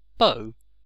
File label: bow; ktuberling